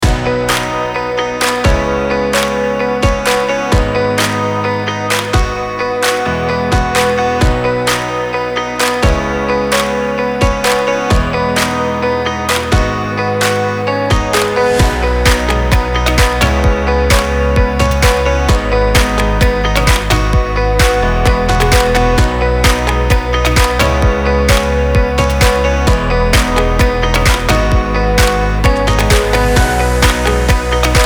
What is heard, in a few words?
Upbeat Corporate Loopable